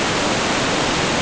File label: waterfall; Ambient; MX vs ATV Unleashed; XBOX